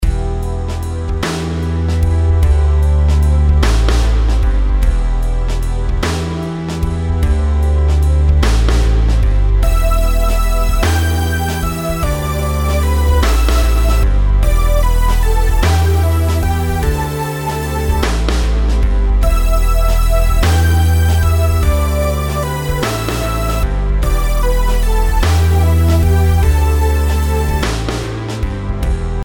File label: rhythms compound 8s